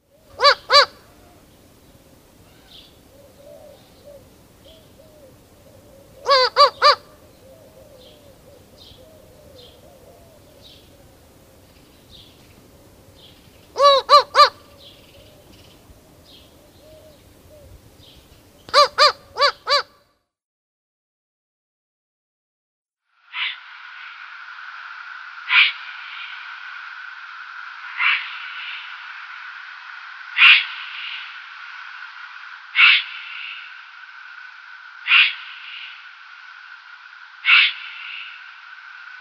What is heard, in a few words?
Eurasian Eagle-Owl nestling